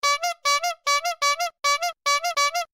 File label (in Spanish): Corneta